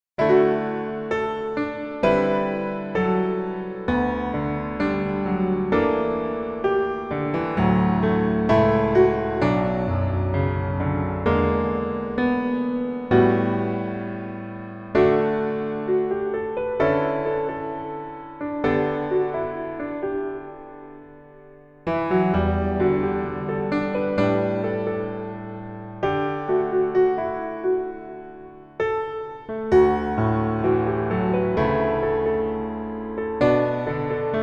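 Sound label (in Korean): Piano version 멜로디MR 남자키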